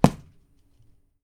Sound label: PUNCH D, FIGHT; 1000fx